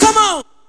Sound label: VOX SHORTS; Megabass Remix! 2